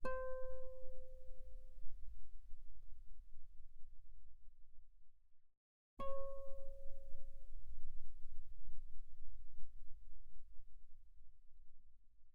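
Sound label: Guitar pianissimo sul G C5 Db5; mono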